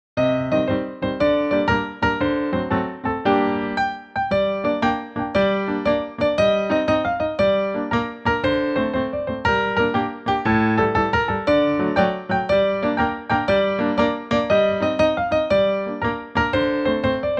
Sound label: Tarantella